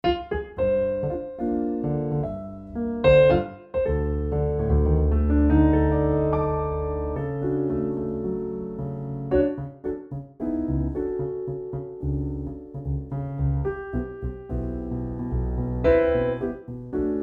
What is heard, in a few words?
CFX, garritan cfx concert grand; garritan cfx concert grand aria player presets